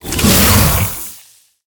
Attack

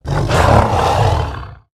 Park Panther Play

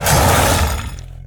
Stoneskin Basilisk Attack

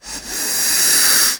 Play Snake

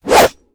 Black Pawn Attack Underlay

